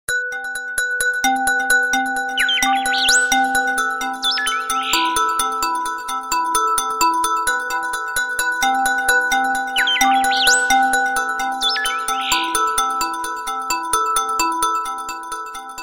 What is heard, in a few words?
Sweet Alarm Bells